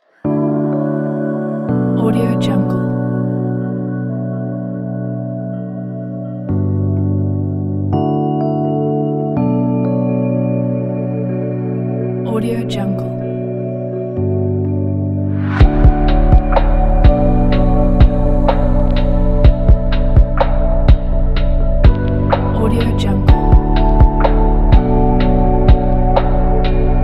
ambient lounge electronic

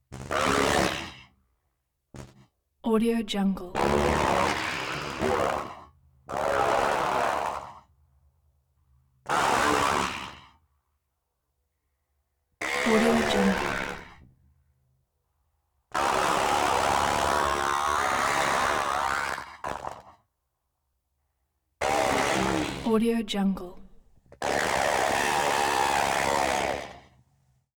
robot scream processed short and medium screams; prosoundfx

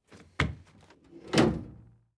ambulance door; prosoundfx

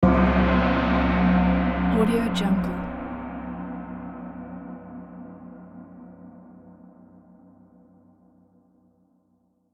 gong

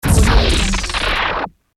starship overheard flares electrical sparks

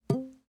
comic tube bounce

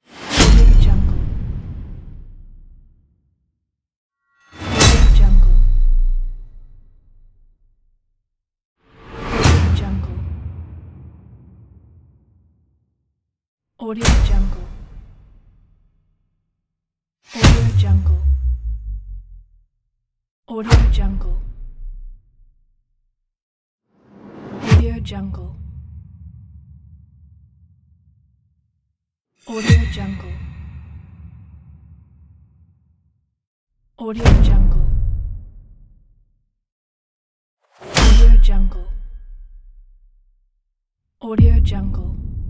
cinematic sound effects pack

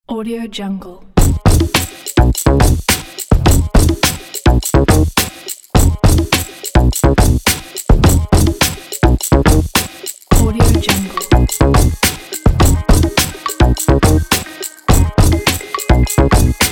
urban soulful

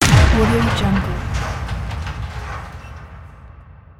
shooting explosion

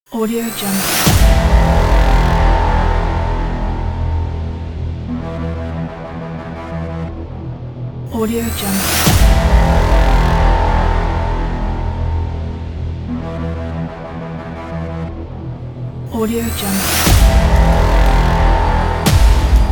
action hybrid trailer; dennis-mezzo

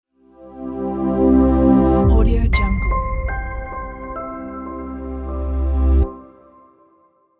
clean piano melody logo ident

